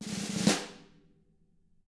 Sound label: snare roll; ILIO; Double Platinum Drums 1